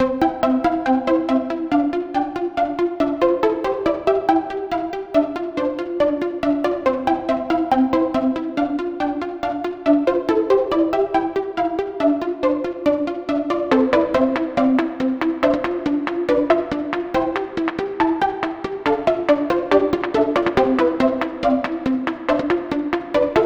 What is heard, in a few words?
goofy